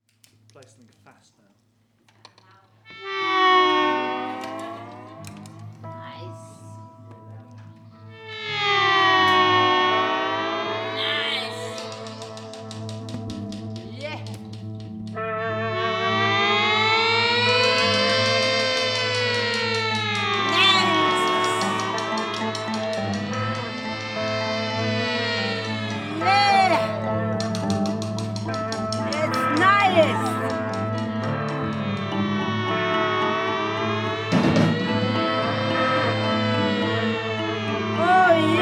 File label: Nice Noise, Orchestra; Cube Orchestra; Rehearsal